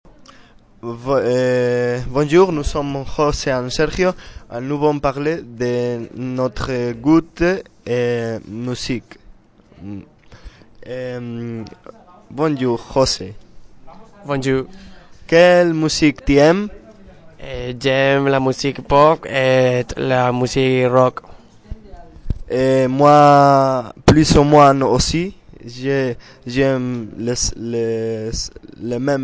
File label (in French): musique